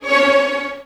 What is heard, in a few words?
str f#, strings; house; PS-28 Vocal House Samples